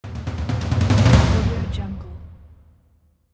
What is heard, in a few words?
cinematic toms roll